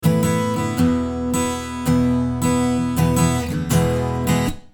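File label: Acoustic Guitar Studio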